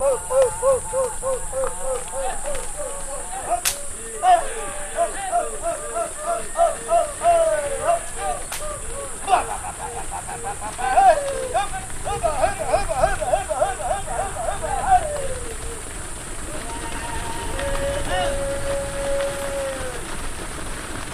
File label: Venezuelan Cowboys, rancher